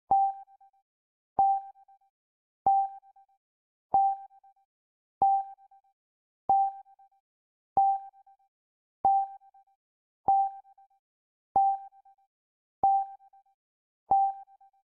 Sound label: GPS Alert; LG isai LGL22